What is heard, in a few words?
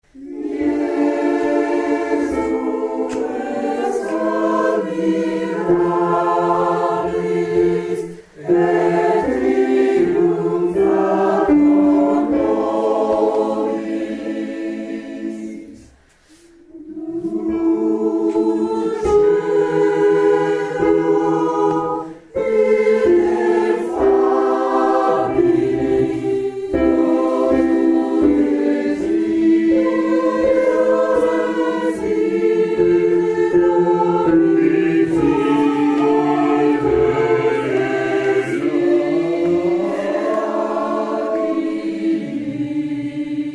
JESU REX Tutti